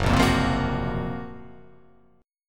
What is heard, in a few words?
F sharp Major 7th Flat 5th